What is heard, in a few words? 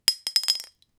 potion ingredient dropped; alchemy